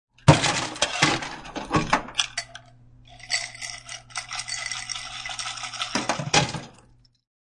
Digging in an ice machine then dumping ice in glass.; Human; Ice Machine Dig Into Glass